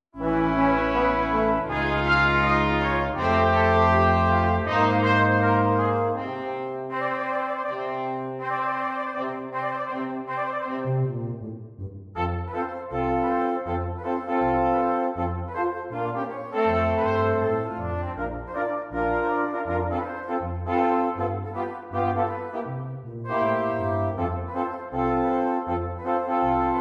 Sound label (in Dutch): jingle bells instrumentaal